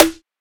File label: Snare - E; Drums; One Shots; Snares; Cymatics; EDM Starter Pack